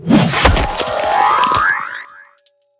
cursed sword; battle

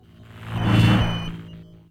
account deny

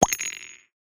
steal gem; pirates

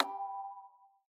chat

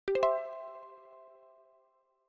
blackjack

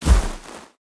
bear step; chaosbear

